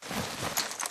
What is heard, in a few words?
spectre zombie drag grunt; Titanfall 2